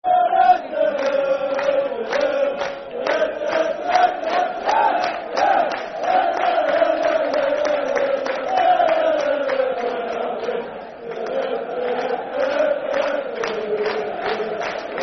Football Chant (Soccer Song), Brighton; Derup Der Der; Brighton Bhafc

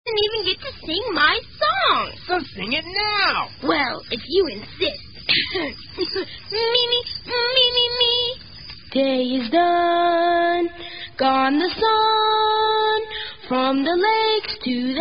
Taps

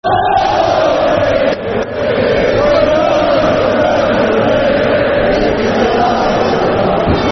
Football Chants (Soccer Songs); Blackburn Rovers Brfc

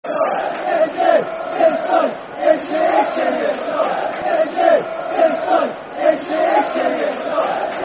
Incey Give Us A Song Football Chant (Soccer Song); Walsall Wfc

